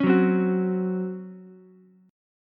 Db Major 7th Suspended 4th Db down Ukulele; strummed; JGuitar

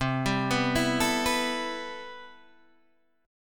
C Major 13th, Guitar Open G, chord

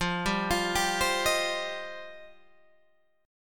F 7th Suspended 2nd F down Guitar Open G

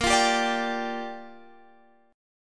Bb 6th Bb, Guitar Open F; strummed; x,17,17,14,17,14

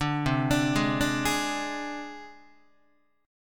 C Suspended 2nd Sharp 5th C Guitar; arpeggiated; 4A,4E,5A,5D,5G#,6C#; 5,8,5,6,6,7; JGuitar

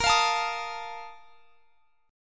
Bb Major 7th Suspended 4th; strummed

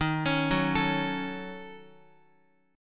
Eb Minor 7th Flat 5th, down, Guitar; arpeggiated; 4F,4A,5C,5G,5C,6E; chord; JGuitar

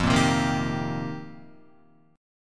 F 7th Suspended 2nd Suspended 4th, Guitar

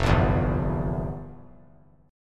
F Major 7th Suspended 2nd Suspended 4th, chord; strummed; 3C,3F,3A,3D; FM7sus2sus4; 5,5,7,5; JGuitar

